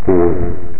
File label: laugh